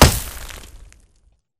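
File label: Bullet Light Ballistic Dirt impact; Titanfall 2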